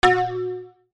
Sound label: BELLS